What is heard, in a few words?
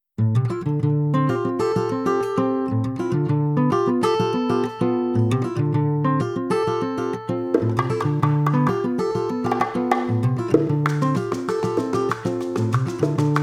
Cafe Latino